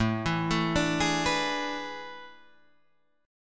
A Major 7th Suspended 2nd Suspended 4th, Guitar, Open G